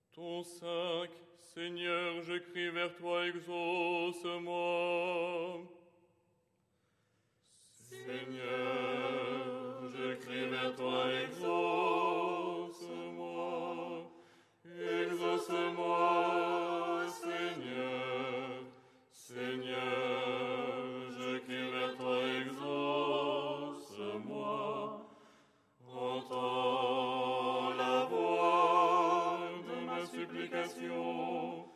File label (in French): stichere lucernaire ensemble